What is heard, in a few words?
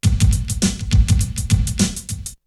Drumloop; ZERO G - Datafile DISC 3